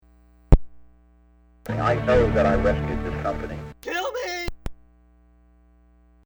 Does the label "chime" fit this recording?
Yes